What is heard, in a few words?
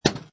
Pinball Sound Clunk; Video Game Sound Effects; Lynne Music